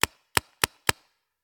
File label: Paintball Gun; Sound Ideas; Guns